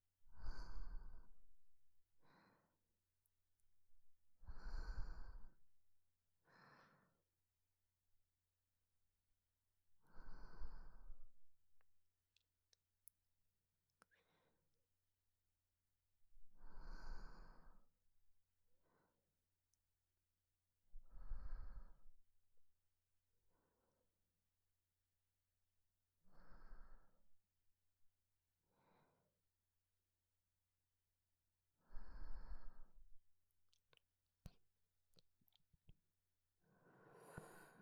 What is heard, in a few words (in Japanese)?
寝息